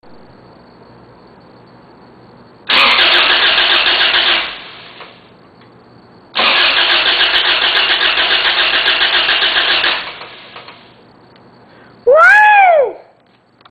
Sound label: crank